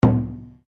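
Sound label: Drum Taiko forte, Japanese Taiko Drum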